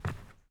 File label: step wood